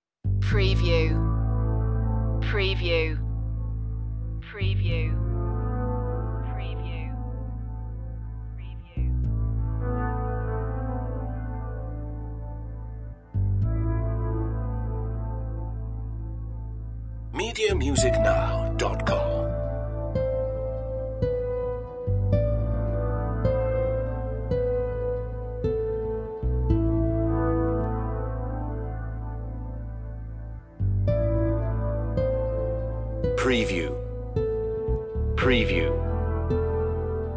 suspicion no drums

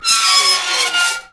Metal Screech Intense High Pitch

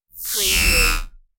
alien power charge

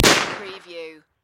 Submachine Gun MP40

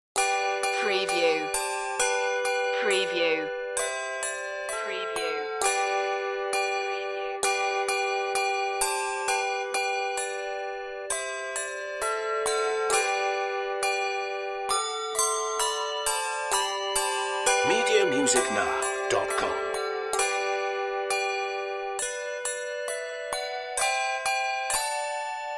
handbells; good king wenceslas

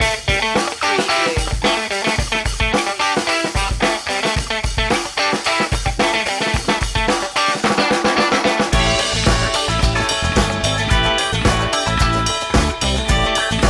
funk spice groove loop

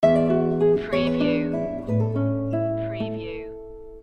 vals sting; classical music for media

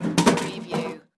Vending Machine

